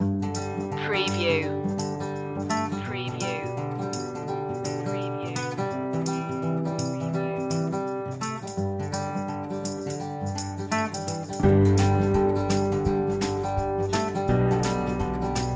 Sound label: easy pickings